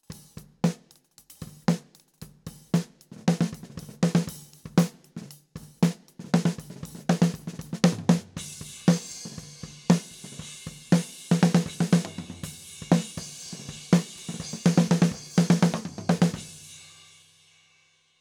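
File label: kick head off mid snare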